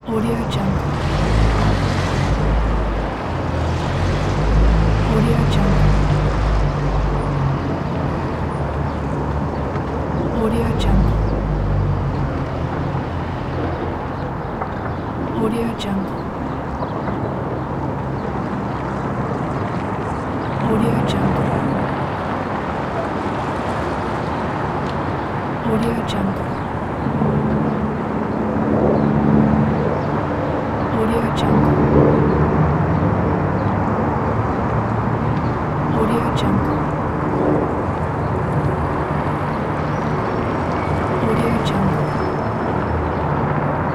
city atmosphere with helicopter and ambulance siren